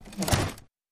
popup appear; Fishing For Gold

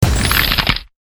freeze; games; Fire And Ice

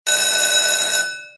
RING SHORT; Helena

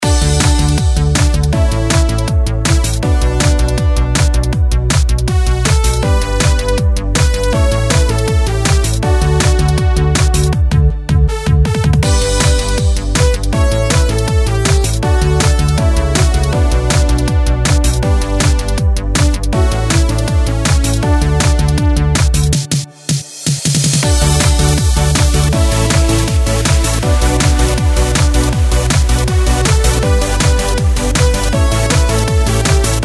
reelspin bg; Party Girl Ways

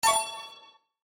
Bet Less; One Shot Fishing

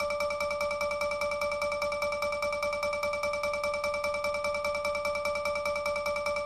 increment; Sheriff Of Nottingham

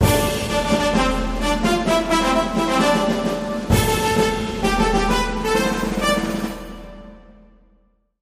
trumpet animation; Sheriff Of Nottingham

